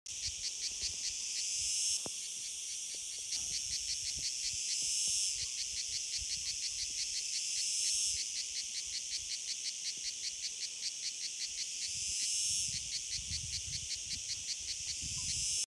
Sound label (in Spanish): Estridulación cicadas